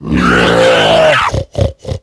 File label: startle; PC Gamer IT CD 45; SMAN DEMO; baddys; brutal